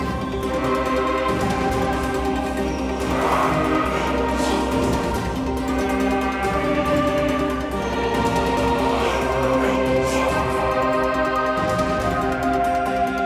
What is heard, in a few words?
Player Defeat